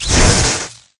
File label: shock; minecraft; technic